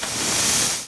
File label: move; archdam